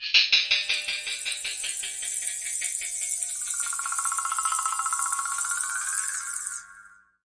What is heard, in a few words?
dubby click n clickin; Electro and Synthetic